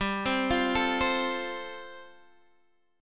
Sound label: G 7th Suspended 2nd Suspended 4th, Guitar Standard; G7sus2sus4 arpeggiated